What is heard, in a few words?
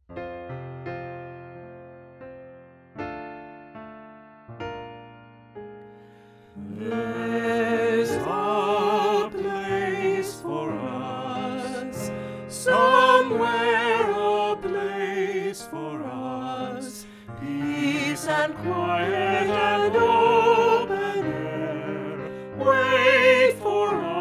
SAB Piano